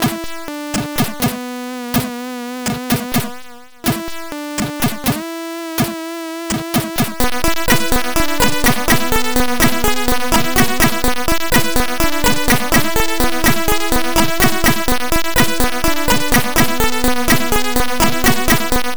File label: c64 in game; rambo iii